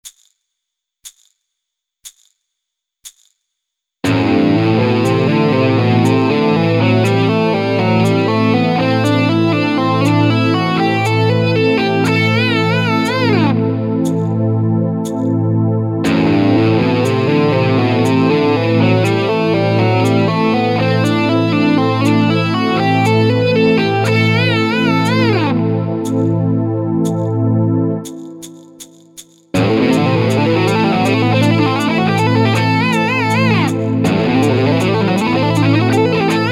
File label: LEGATO STYLE